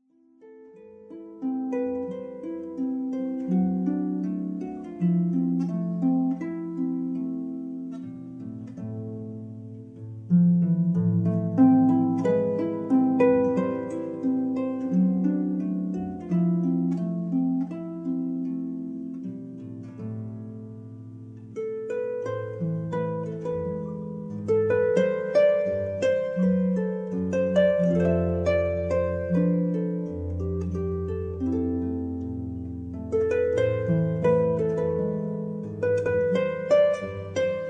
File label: harp